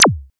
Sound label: Sound FX Sub H Pitch Alien